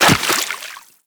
Walk Water; footsteps; Warframe; Operator

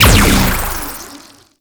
Shot, Shotgun; Crp BE Arca Plasmor

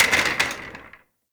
Office Chair Impact

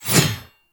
Heavy Scythe Whoosh Small; Warframe; Duviri Heavy Scythe

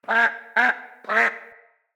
Trapped Duck Call; Encounters; Animal Rescue

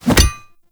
Wolf Axe Catch; Grineer Wolf

